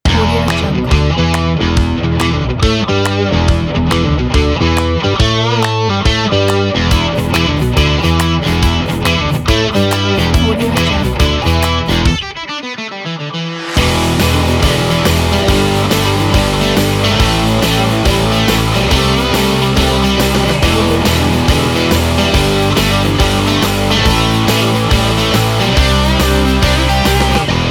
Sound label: energetic indie rock